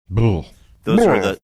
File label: making funny mouth sounds